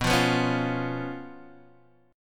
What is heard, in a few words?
B Suspended 2nd Flat 5th, Guitar, Open D